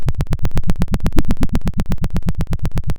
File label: pop, Sound of a helicopter